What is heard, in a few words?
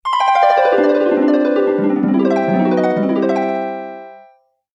Fanfare (SNES Ver.); actraiser renaissance original soundtrack